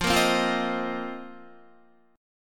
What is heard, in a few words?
F 7th Suspended 4th F down Guitar; strummed